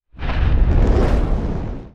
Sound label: Fire Transition Fire sound effects